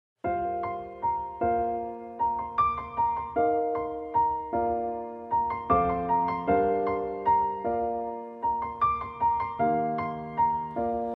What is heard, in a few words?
instrumental piano music tone